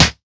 original acoustic snare D# key, Royality free acoustic snare sample tuned to the D# note.; snare drum samples